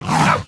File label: snake; fruitsnake